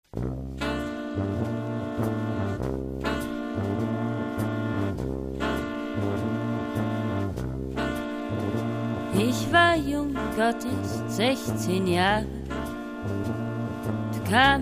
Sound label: brass; surabaya